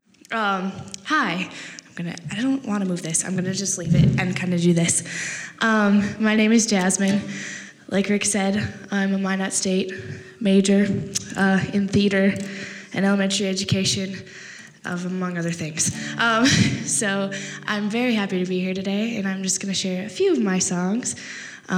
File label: Jazz